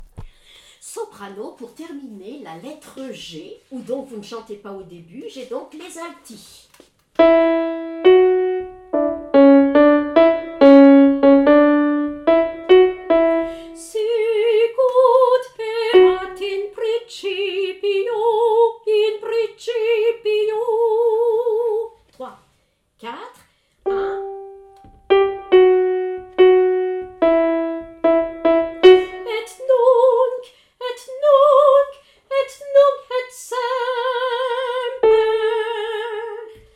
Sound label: Soprano; Magnificat Pachelbel; Ensemble Vocal Chanteval